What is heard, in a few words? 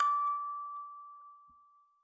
one note from the musicbox; Pony Fan Music Remix Stems